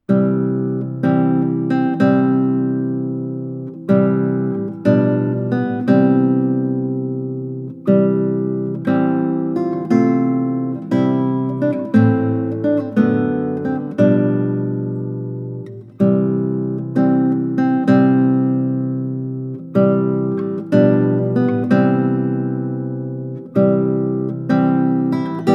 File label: Bridal Chorus, wedding songs